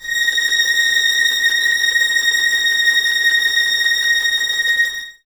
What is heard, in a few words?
Violins Tremolo A#6; Miroslav Vitous; 11 Violins